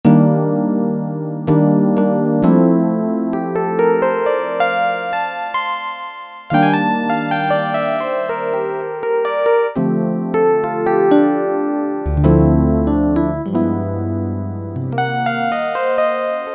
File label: Real Rhodes, Kawai K1m